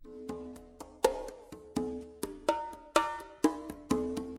Congas

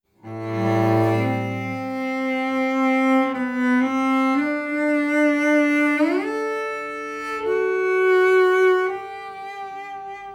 Cello 180

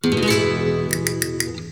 Castanets 130; ACID

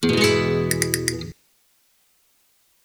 Castanets Noise Free

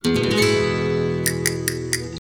Castanets 180; MPEX

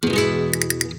Castanets

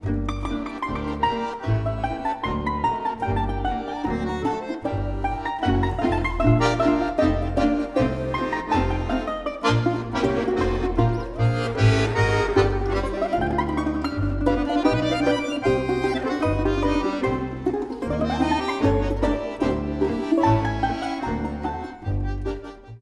Rus Folk

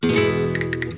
Castanets

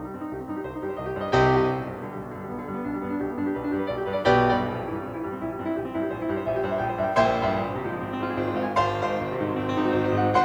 Piano Noise Free

